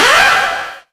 Synths C; vengeance essential club sounds-3

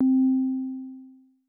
synth poly c2

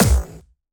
Attack Noize, Jumpstyle Kick; Bassdrums; Jumpstyle Multis; vengeance essential club sounds-3; VEC3 Bassdrums

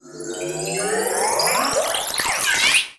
Sweeps Medium

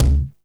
Percussion; Vengeance Samples

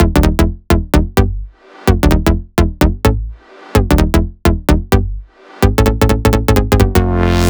128BPM Melody; Vengeance Samples; VFH1 128BPM Roboto Kit

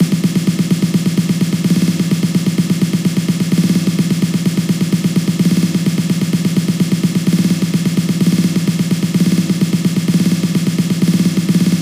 128BPM Drivor, House Electro Fills; 8Bars; Vengeance Samples

